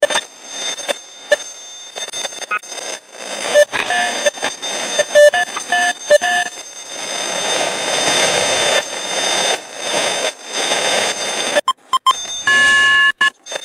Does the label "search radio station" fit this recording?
Yes